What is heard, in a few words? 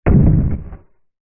fantasy giant single footstep distant heavy wood